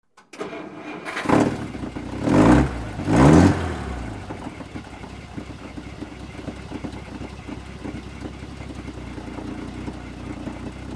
Dodge Power Wagon Fire Truck, Interior Pov, Gear Grinds.